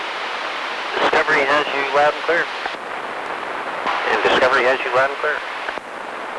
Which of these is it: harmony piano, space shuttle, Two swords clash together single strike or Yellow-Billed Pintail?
space shuttle